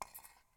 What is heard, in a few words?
Rocks